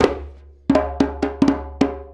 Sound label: Djembe Fill; Sonic Foundry (Sony Creative Software); Essential Percussion; Drums